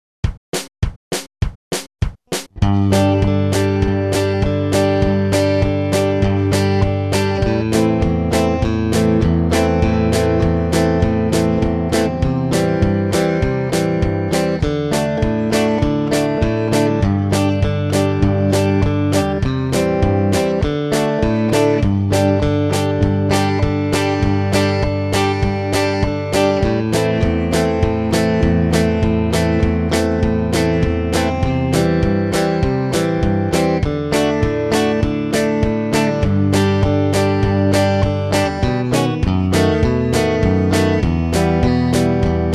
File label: Rhythm Guitar